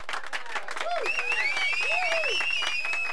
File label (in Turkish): alkis